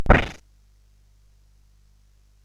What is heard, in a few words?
SFX; Narco Police